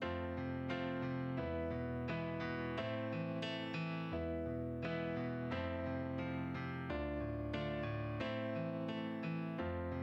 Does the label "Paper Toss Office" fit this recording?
No